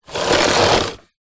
household vacuum cleaner roll wood floor power off; zapsplat